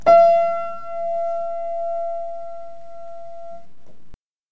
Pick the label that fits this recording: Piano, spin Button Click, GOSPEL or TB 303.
Piano